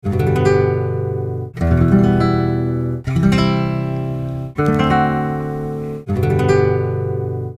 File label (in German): Eine erweiterte Kadenz in G-Dur; Harmonielehre